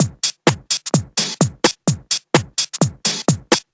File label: House Beat, 128 BPM